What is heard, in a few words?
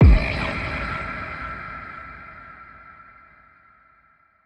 IMPACT; HELP impact